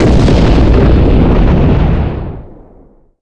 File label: EXP LRG; HG2DEMO